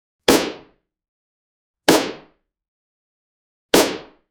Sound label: loud pistol thump unique; firearm